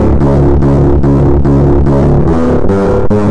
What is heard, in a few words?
144 ooka booka bassline